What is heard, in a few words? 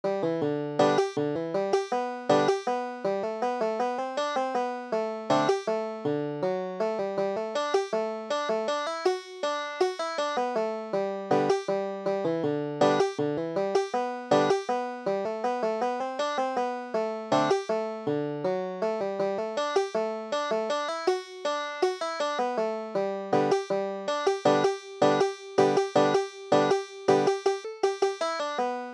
walking in my sleep, banjo